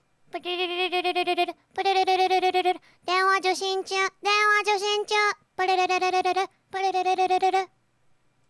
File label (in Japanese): denwa